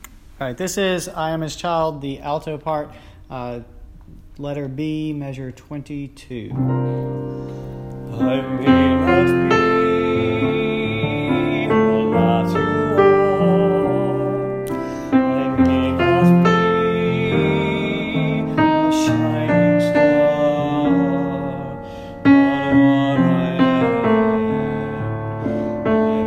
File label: alto